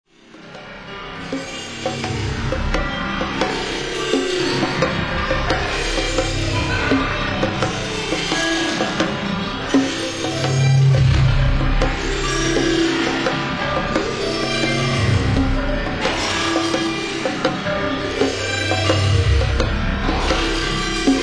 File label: AMBIENT EXPERIMENTAL